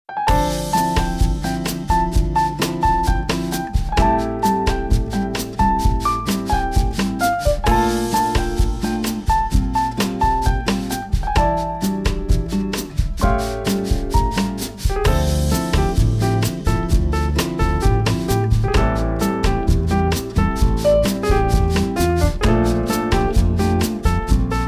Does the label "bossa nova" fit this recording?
Yes